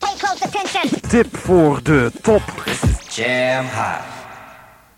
jingle; Tip voor de Top

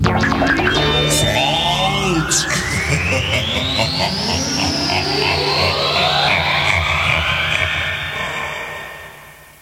Jingle prijsvraag fout; Sportkalender

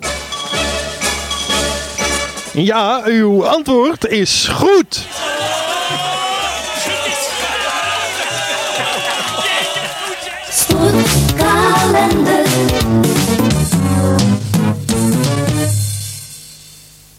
Jingle prijsvraag goed; Sportkalender

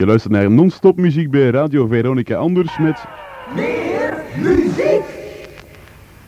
Jingle meer muziek; Non-Stop Muziek

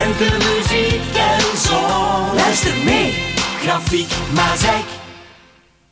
Jingle lente; Gezongen lentejingle. Samenvatting: lente, muziek, zon, luister mee.; Grafiek

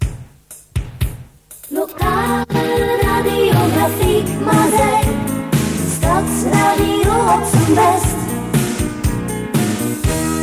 Gezongen jingle, stadsradio; Grafiek

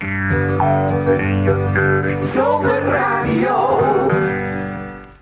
Jingle zomerradio; Seizoenen

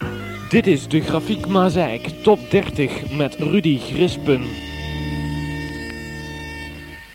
jingle top 30; Top 30 Rudi Grispen

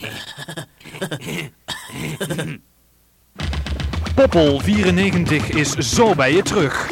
Uurafsluiting; Pop-Poll 94

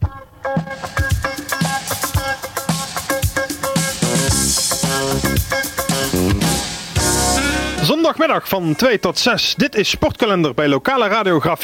Jingle zondag; Sportkalender

